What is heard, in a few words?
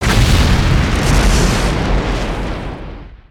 Rocket Explosion; cinematics